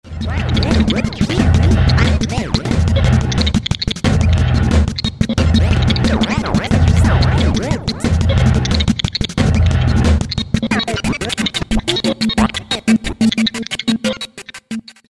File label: beats